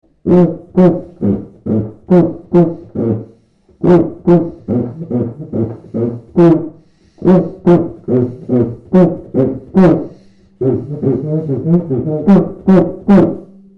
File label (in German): Brummtopf